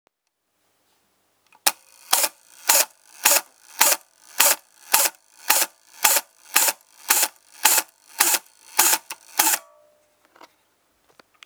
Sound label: robot